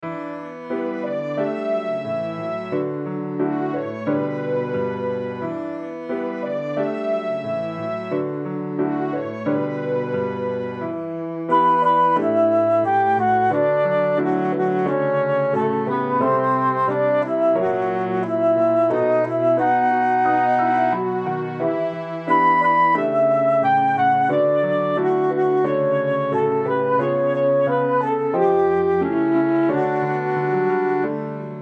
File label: inspirational